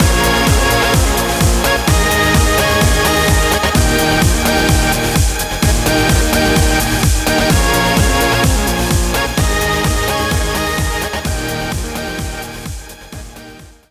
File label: Stinger; Champions Field